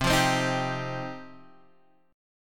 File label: F 7th Suspended 2nd